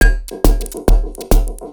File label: percussion loops, Techno; PS-45